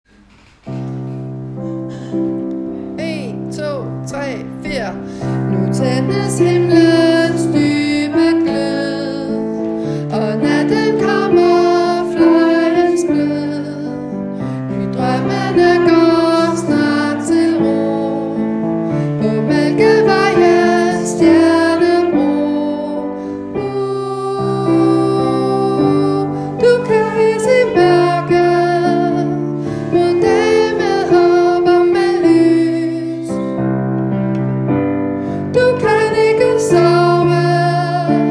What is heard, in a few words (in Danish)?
sopran